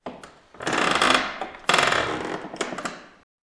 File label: creak